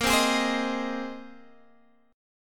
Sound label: Bb 7th Suspended 2nd Suspended 4th Bb, Guitar Standard; Bb7sus2sus4; strummed; x,13,10,13,13,11; JGuitar